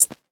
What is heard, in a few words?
hh, Hats; drumkits; fl studio; goodtakimu drumkit